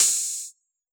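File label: T9 hi-hat Open, Yamaha DJX; 155 DJX Kit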